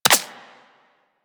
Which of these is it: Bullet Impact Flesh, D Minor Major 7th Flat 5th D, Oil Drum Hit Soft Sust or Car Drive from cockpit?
Bullet Impact Flesh